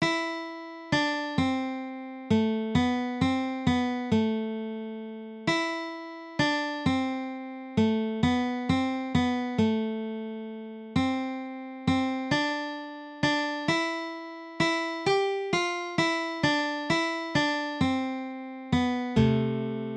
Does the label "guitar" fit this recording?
Yes